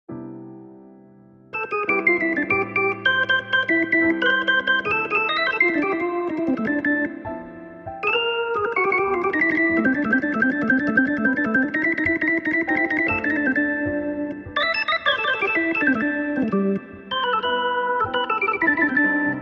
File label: Sleepy Jazz